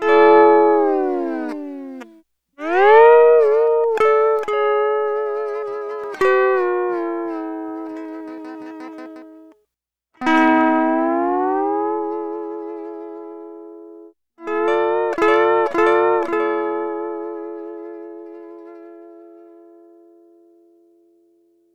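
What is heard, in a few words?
Sunrise Guitar Chord G# Dry, Lounge; Zero-G Kocktail Kollection; Construction Kitz (Lounge); Sunrise Mixes 082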